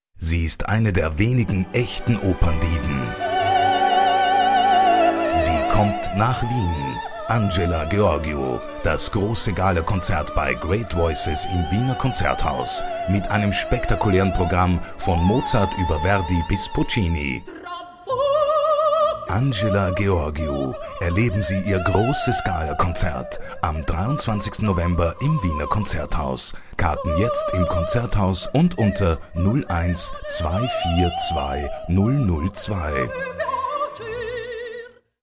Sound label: Great Voices